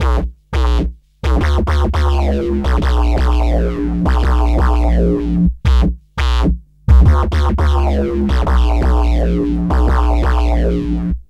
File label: Fuzz Bass, Drum & Bass; Fluid Dynamics